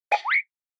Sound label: Motion Pop